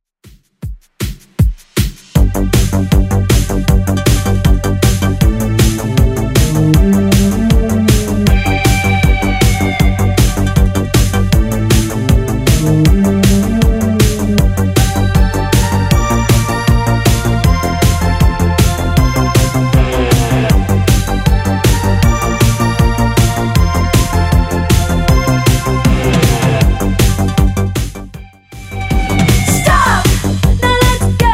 80s Redrum, 157 bpm

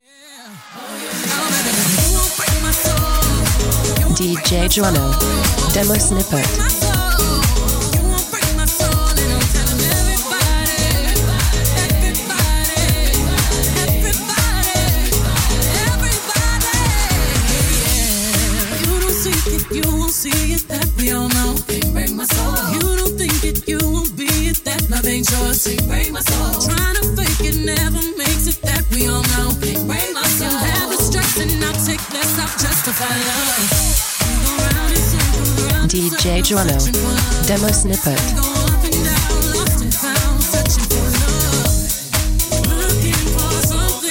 121 bpm, Abm

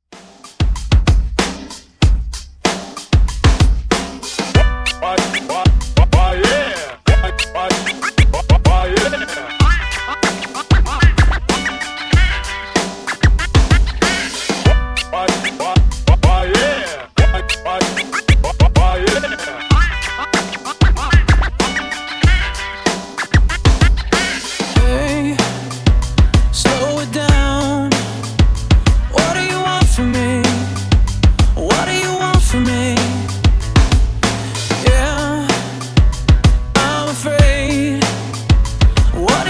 scratch; Redrum Scratch

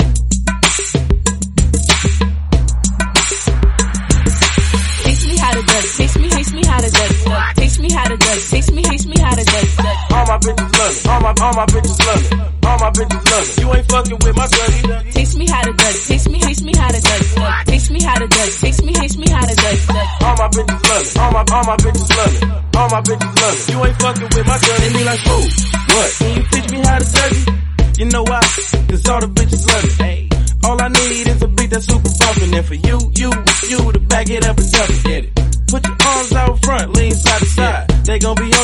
REDRUM 95bpm Bb

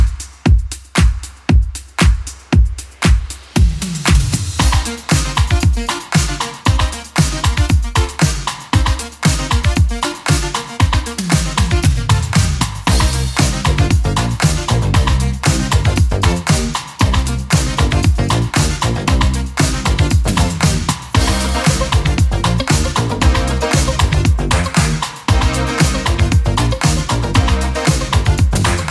80s Club Edit 116bpm

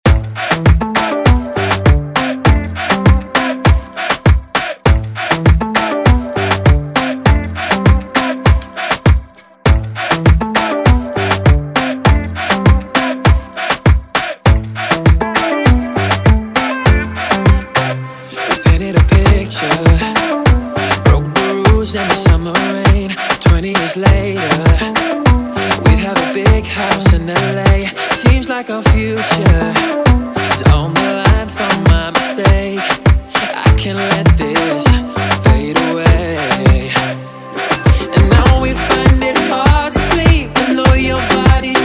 Moombahton Redrum

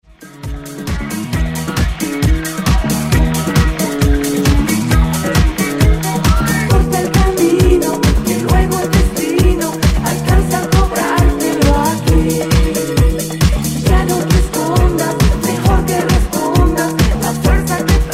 Spanish

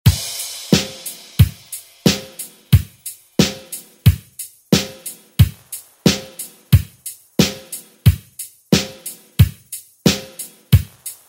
70s ReDrum

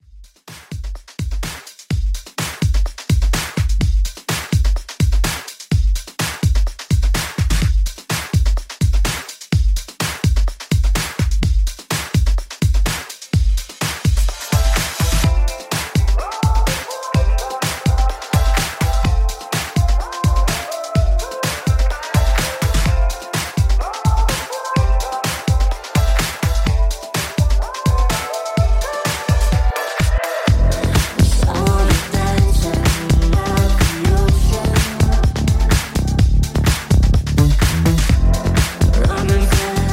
Top40

